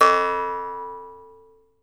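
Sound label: Jaw Harps, Pitched Percussion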